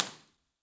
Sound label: Rare Books Room; Wangenheim; Impulse Response; EchoThief Impulse Response Library